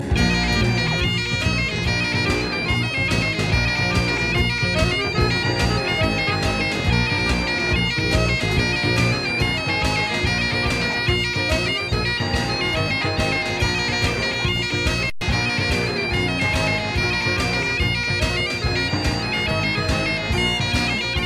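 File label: Irish music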